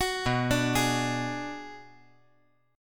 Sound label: B Minor 6th B